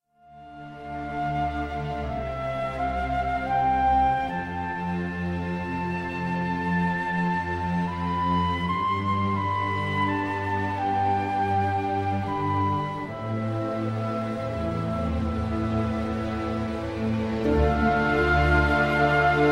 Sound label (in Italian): Base musicale sofferenza